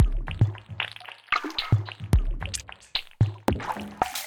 Sparkle Kit Groove 112 bpm, Drums; MIDI Clips; Ableton; Factory Packs; Lost and Found

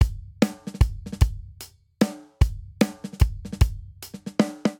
Swing Waltz Breakdown 3/4 150 bpm, Drum; MIDI Clips; Ableton; Factory Packs; Drum Booth

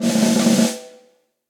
Snare Press Cold Tight Room; Snare Articulation; Drum Hits; Session Drums Studio; Standard Hits

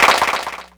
Clap box Pitch 12; mpk mini presets; Ableton Foundation FreePacks

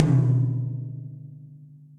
Tom Mid LowLoudSquashed; drum hits; Ableton; Factory Packs; Session Drums Club; Standard Hits

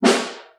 SN1 Far, Snare; Ableton Foundation FreePacks; Snare Designer

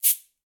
Cabasa Long; Latin Percussion; Drums; Drum Hits; Shaker; Ableton; Factory Packs

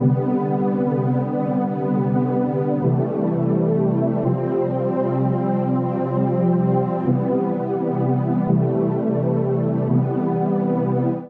Hang Pad C Major 85 bpm; Accompaniment; Tonal; MIDI Clips; Drive and Glow; Ableton; Factory Packs

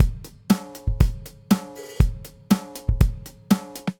32 Pad Kit Jazz; Multisampled; Drum Kits; Ableton; Drum Booth

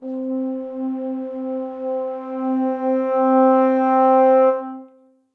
MPE Intimate Slide, Brass Quartet; Ableton; Factory Packs; Spitfire Audio

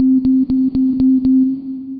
Lunar Steps, Synth Rhythmic

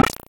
Vibro Pock, Bass Percussive; Ableton; Creative Extensions

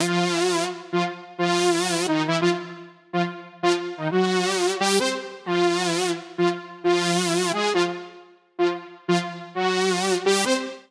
Funk Lead Eb Minor 88 bpm; MIDI Clips; Tonal; Melodic; Ableton; Factory Packs; Chop and Swing

